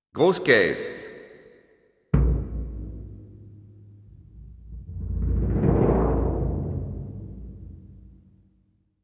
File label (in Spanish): Bombo